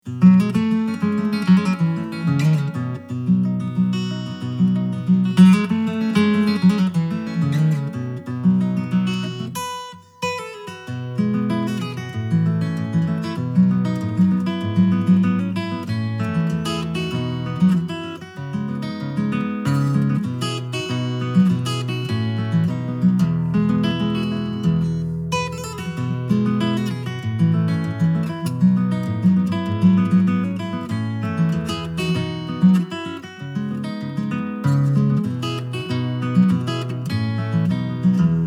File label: Bream Samba, Guitar Solo